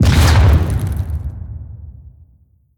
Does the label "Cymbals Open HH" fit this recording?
No